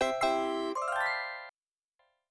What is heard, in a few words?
game win animation